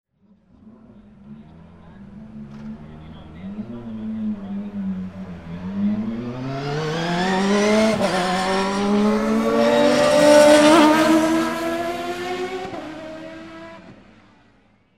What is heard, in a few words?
CARS